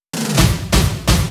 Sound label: Fill 128 BPM; Vengeance Samples; VEH1 Loops